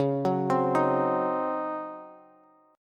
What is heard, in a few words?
Db Major 7th Suspended 2nd Suspended 4th, Db, Bass; DbM7sus2sus4 arpeggiated; JGuitar